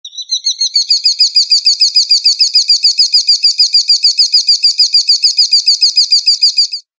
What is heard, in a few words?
IPHONE4S BIRD SOUND RINGTONE